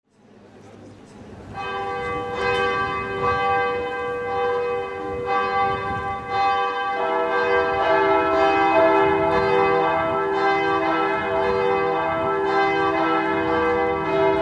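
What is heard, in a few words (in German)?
Domglocken läuten tiefe Kirchenglocken Cathedral Bells; 10 Minuten tiefe Kirchenglocken; Entspannen Einschlafen Geräusche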